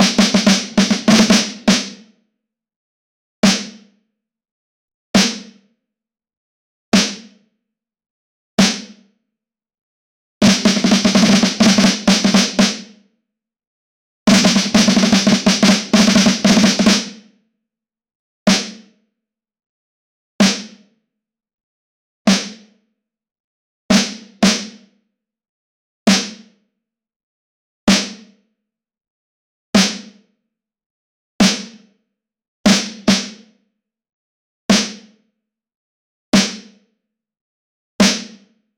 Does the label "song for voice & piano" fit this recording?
No